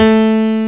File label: piano